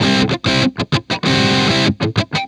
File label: Distorted Guitar